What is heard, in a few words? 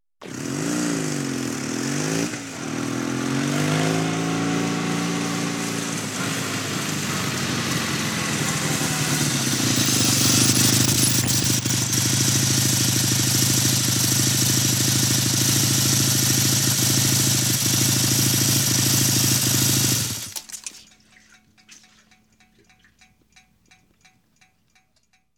Cruiser Motorcycle Approaching and Stopped